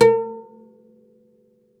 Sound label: OUD A3 -R; E-MU Producer Series Vol. 7 – Old World Instruments